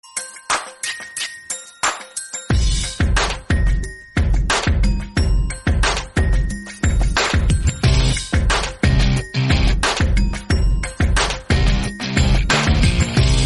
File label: african morning ringtone